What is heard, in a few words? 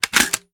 reload empty mag mag out, reloads; gmod